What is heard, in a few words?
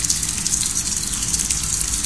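ambient water leak loop; video games; nature; source engine; portal2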